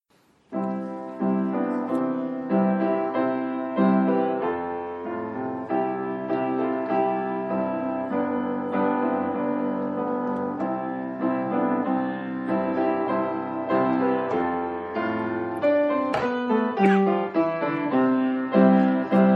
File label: piano; hymn training